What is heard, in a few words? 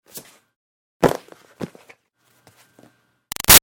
Foley Frisbee Plastic Throw-Catch; Fumble; Small Moves; Miscellaneous Sports; BigRoomSound